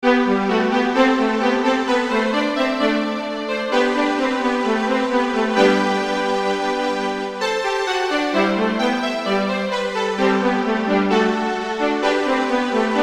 duetto pour violons sol majeur; chauveau bruno

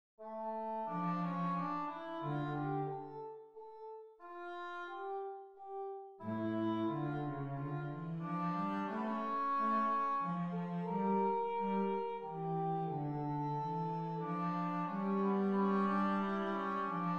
menuet mineur; lully jean baptiste

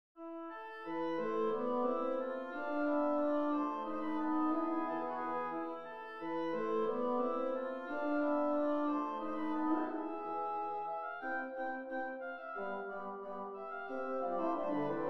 rigaudon mineur

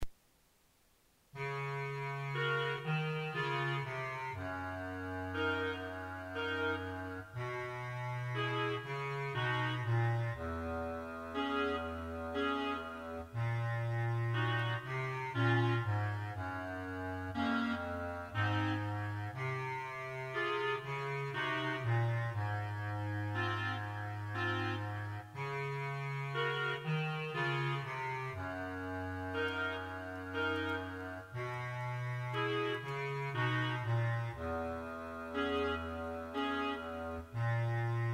valse; valse noire

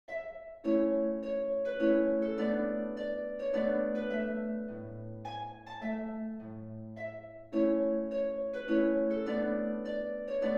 danse allemande la majeur; haydn joseph

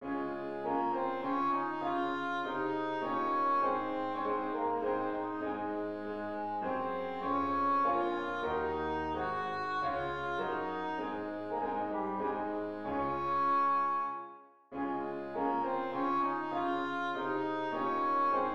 valse ra majeur; beethoven ludwig van

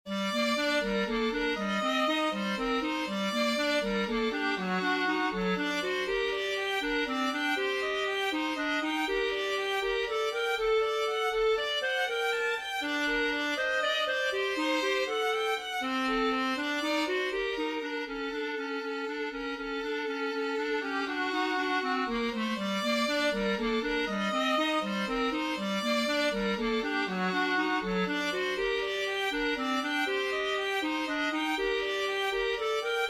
duo pour violon clarinette; adam morgan

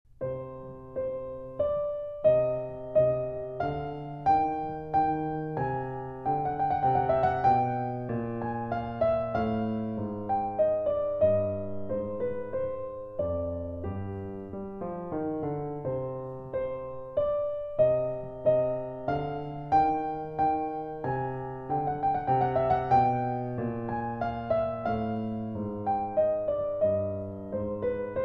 menuet

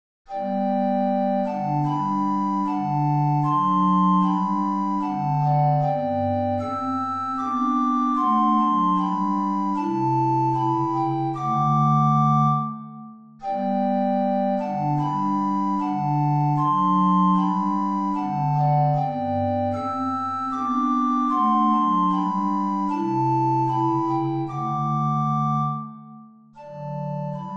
hymne national allemand; haydn joseph

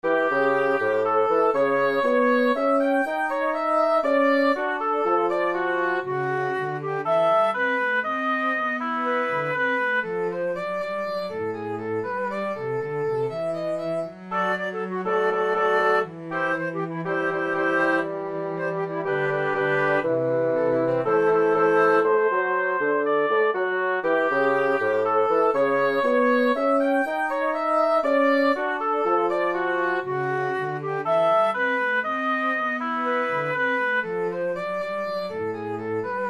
marche

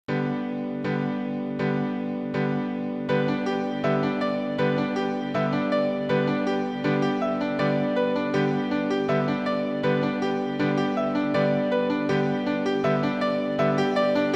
signaux iii pour piano

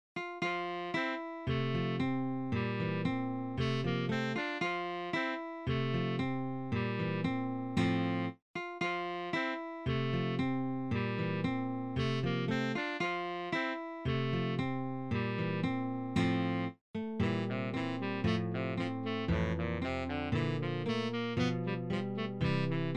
allegretto en do majeur; lewis alastair

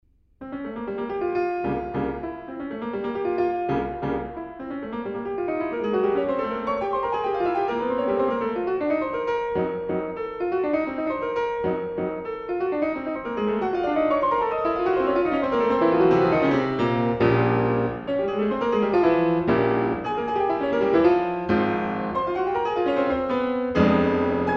prelude en fa mineur allegro molto; chopin frederic

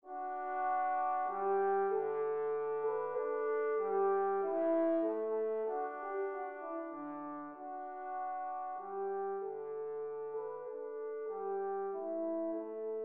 menuet majeur; lully jean baptiste

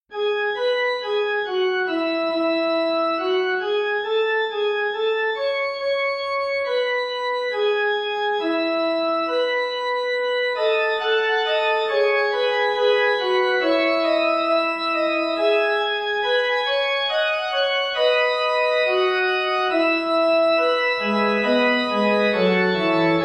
fugue majeur

